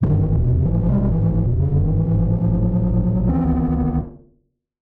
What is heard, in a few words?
Malfunction Synth, malfunctioning synth samples